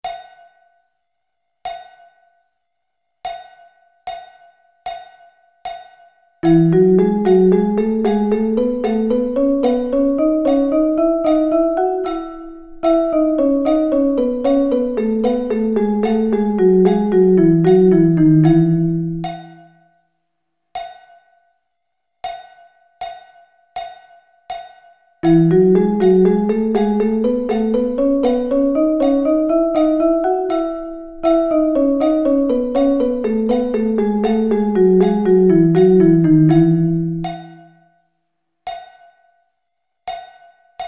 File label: Double Sticking